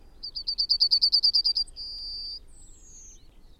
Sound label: birdsong; один из обычных вариантов песни овсянки обыкновенной; Изучаем песню овсянки обыкновенной